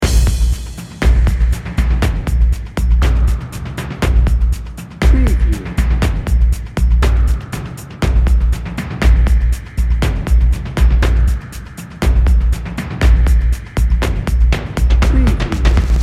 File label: cinematic emotions dnb loop